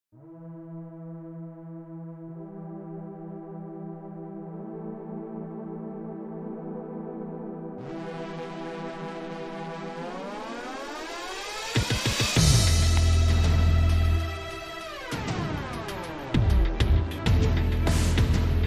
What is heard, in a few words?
Climbing adrenaline; Bootflare